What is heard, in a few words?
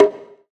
tom single hit g sharp key